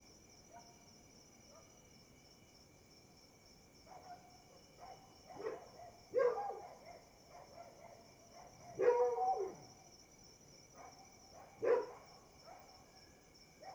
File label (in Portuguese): Cachorros latindo a noite com grilo, um cachorro late mais perto; animais; Coleção Sonora do Cerrado